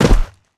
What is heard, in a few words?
land sand, footsteps; player